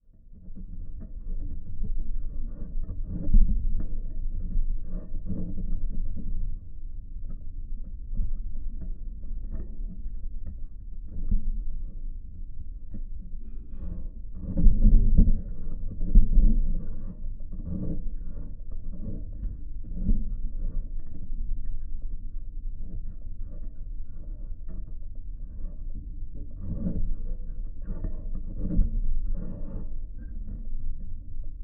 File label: Geofon, Drone, Dark, Spooky; underground sounds